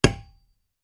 Metal Impacts, Dull; Dull Light Impact With Ring Out. - Construction; pipes